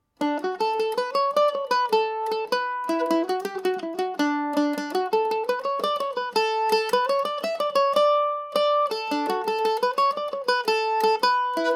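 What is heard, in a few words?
D major, Irish mandolin; merry mary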